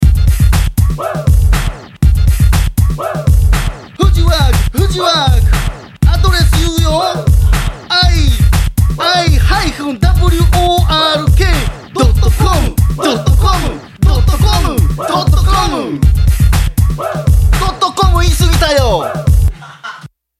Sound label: rap